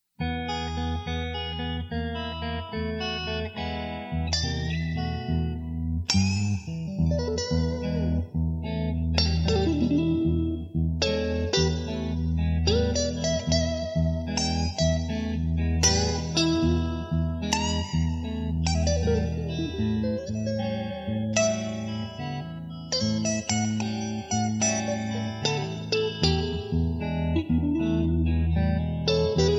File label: Front Porch Blues